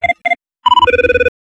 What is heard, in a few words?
ring